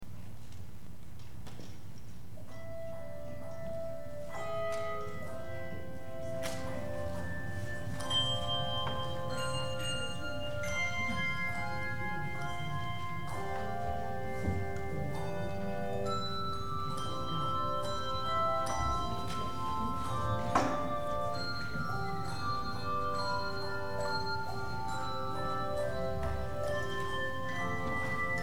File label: Handbells